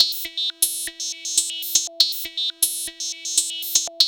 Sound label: SERGE; AMG - Vince Clarke Lucky Bastard